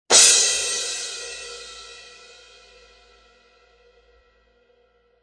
fast crash; AAX X-Plosion; cymbal; Sabian

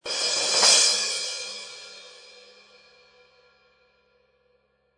fast crash; AAX X-Plosion; cymbal; Sabian

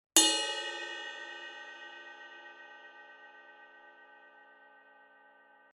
ride bell; 22; cymbal; Signature Neil Peart Paragon Ride Cymbal; Sabian

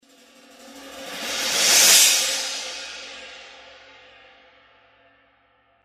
Sabian 18" AAX Ozone Crash Cymbal

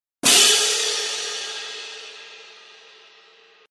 Sabian HHX Synergy Medium cymbals

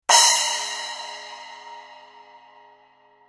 splash cymbal; HHX; Sabian

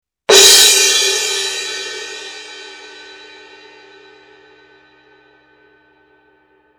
symphonic suspended cymbal; 18"; AA Molto; Sabian

